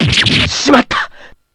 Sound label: stab; ky kiske